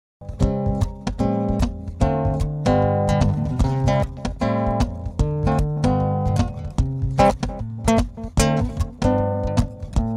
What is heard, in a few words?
Solo Fingerstyle Guitarist; Acoustic Guitar